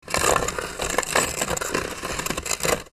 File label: Werewolf claws scratching surface